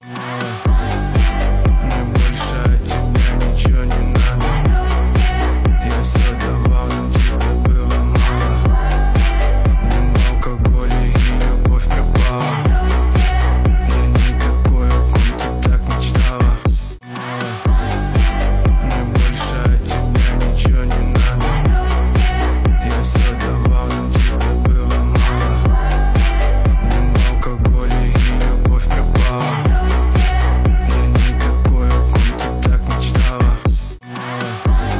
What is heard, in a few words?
remake phonk version